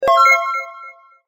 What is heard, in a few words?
signal sound success